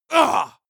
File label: male combat hit soft; soundpool